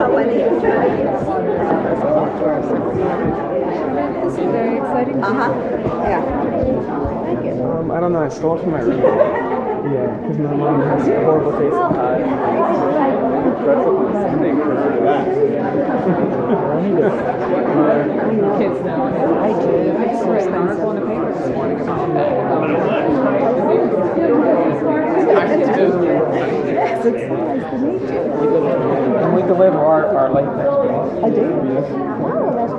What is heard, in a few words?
smoking area crowd; Pitch Black - A Detective's Demise